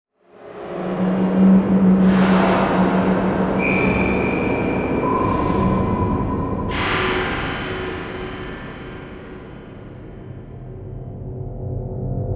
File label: Electronic Whoosh Digital Swooshing Beats Reverse; Fadeout; Module Production Element Title Imaging